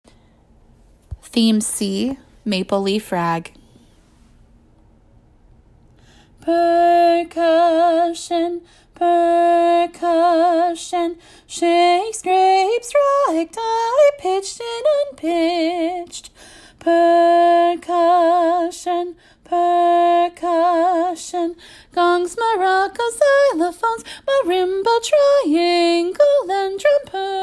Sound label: Maple Leaf Rag; Theme C